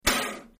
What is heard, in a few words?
Air Mortar Blast From Close Point of View, At 75 Pounds Of Pressure, X3